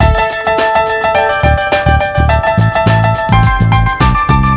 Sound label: Regress (Round Start)